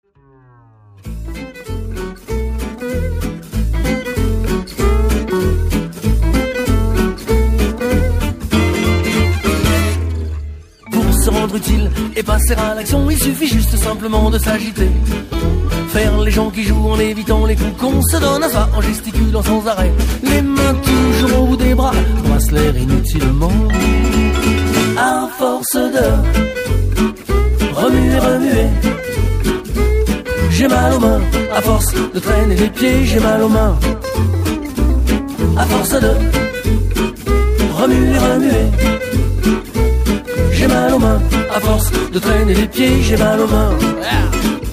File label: French Cafe